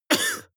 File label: cough male single short; soundpool